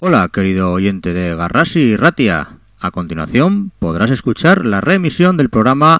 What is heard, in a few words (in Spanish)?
jingle reemisiones; soma